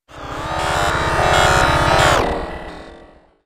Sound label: Data Text Scan Descend, stinger